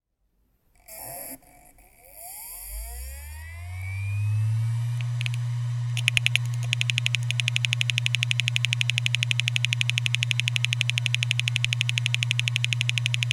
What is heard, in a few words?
Seagate ES2 Headcrash